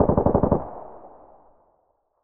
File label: mutant burst long, weapons; SPT Battle Ambience